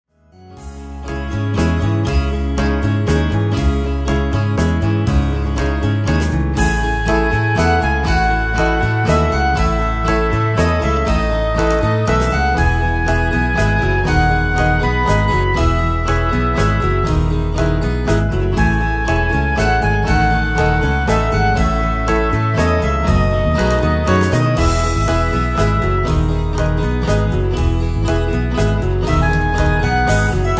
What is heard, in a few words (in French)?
GUITOUNE